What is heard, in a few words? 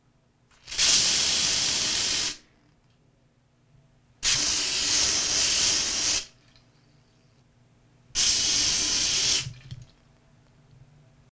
Aerosol Spray